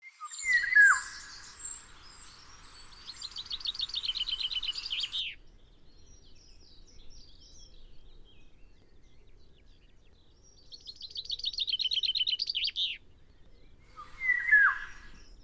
Stimme des Pirol

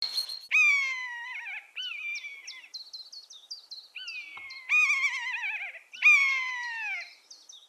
Stimme des Mäusebussard

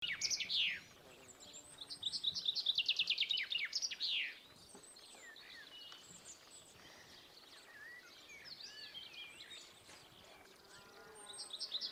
Stimme des Buchfinks

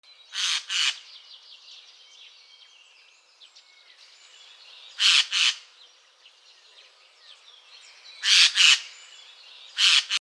Stimme des Eichelhähers

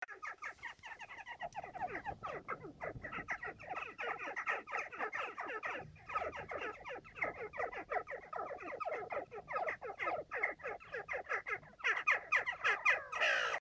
Stimme der Dohle

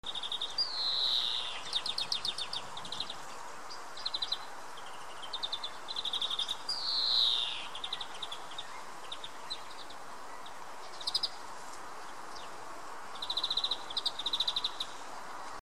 Stimme des Grünfinks

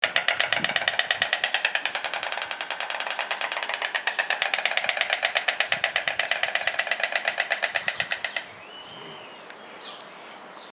Stimme des Storchs

